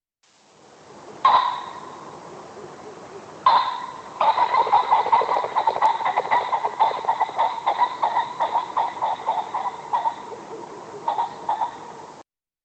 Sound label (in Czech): Bažant obecný, zvuky zvířat; Zvuky přírody 4. – 5. ročník